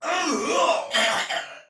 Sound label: Death, Soldier Male; Dialog; Barks; Battle; Sinecure